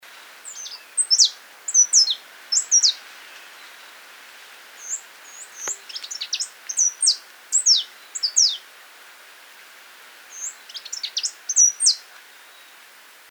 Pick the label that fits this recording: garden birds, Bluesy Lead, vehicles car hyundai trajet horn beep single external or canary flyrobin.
canary flyrobin